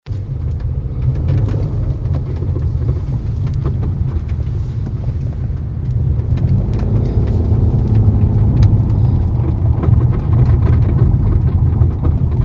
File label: rattling noise, 6th generation maxima 2004-2008